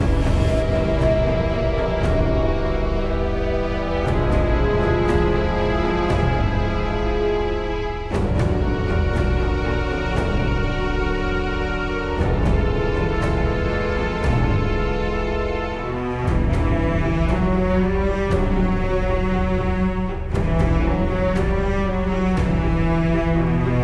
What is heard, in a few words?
bg music preparation